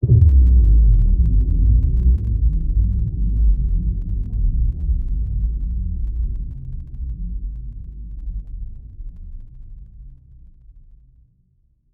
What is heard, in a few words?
Impact Low